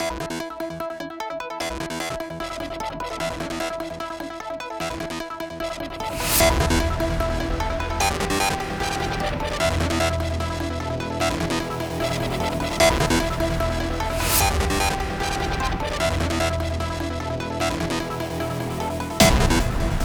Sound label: Dramatic Background Theme (Looped); Astro Flame: Starfighter; PS4, PS5, Switch, Xbox One, Xbox Series X|S